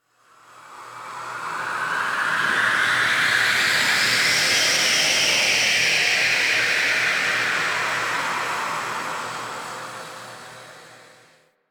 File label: Exterior Phases, Ambience; Warframe; Rail Jack